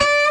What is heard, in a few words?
orchestra string hit D; Orchestra String Hits; PADS and STRINGS